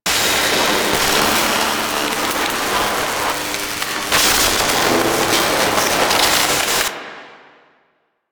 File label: Corpus Powerplant Lightning Storm Calm Loop; Ambience; Warframe; Corpus Railjack; Tether Power Plant